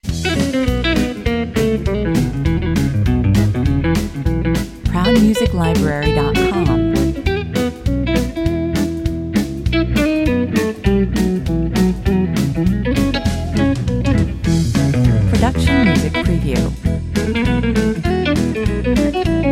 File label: texas swing, 100 bpm